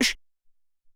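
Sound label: Vox Snare, Alesis SR-18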